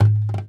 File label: JAZZ TRAP KIT